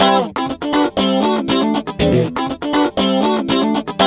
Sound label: GTR; AMG - Now CD-ROM (Roland); Guitar CD